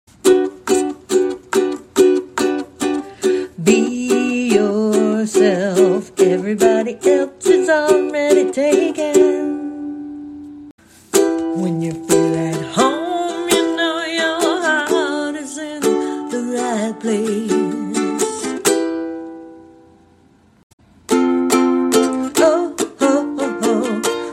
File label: Ukulele